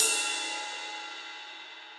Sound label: Ride Mid Big Punchy; Drums; Drum Hits; Standard Hits; Ableton; Factory Packs; Session Drums Club